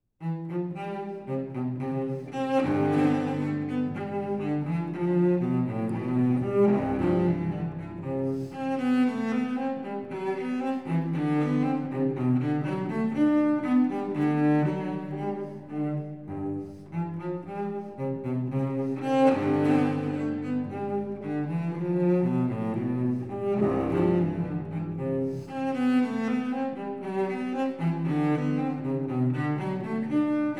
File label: Suite for solo cello in C major, Bourree; BWV 1009; Bach; Cello-Suiten